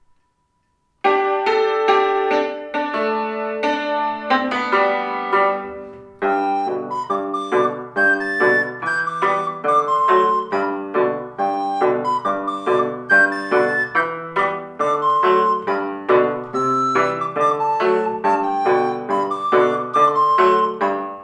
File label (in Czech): flétna + cimbál; Sárga szöget veretek a czismámba; Flautoškola 2 – METODICKÉ NAHRÁVKY a DOPROVODY